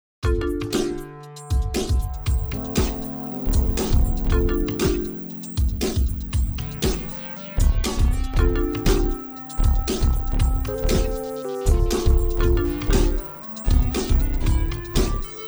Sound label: hip hop hippie